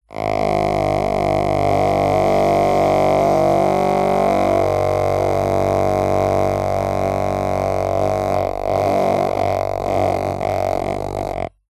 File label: plastic cheap thin vibrate fast; foley; zapsplat